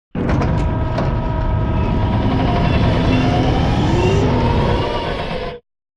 Sideways Town Giant Floor Panel Lower Rotate Servo; Boomtown; Titanfall 2